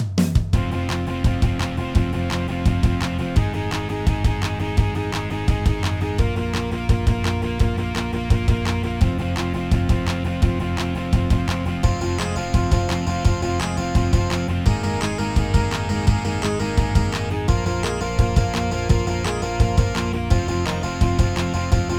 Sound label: boss attack